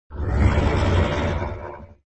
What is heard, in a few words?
Zombie Groan; Extra Lives